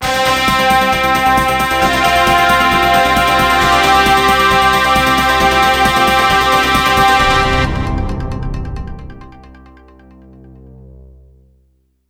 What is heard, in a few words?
Fanfare News Jingle